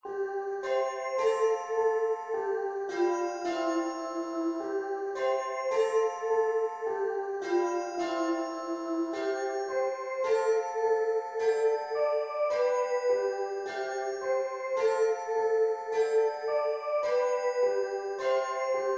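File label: handbell descant 1 octave; I Am Jesus Little Lamb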